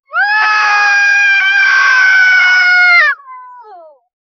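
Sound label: distant many people crying; human emotion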